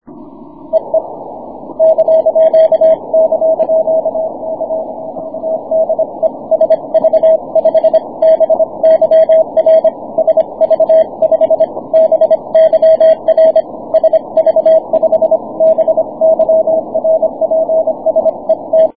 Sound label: 6m cw